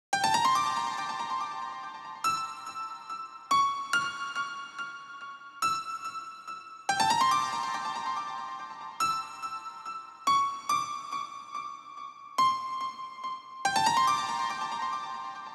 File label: PWM Kit 142BPM Verse - Piano WET, Gmin; Vengeance - Essential Clubsounds Vol.5; Melody & Synth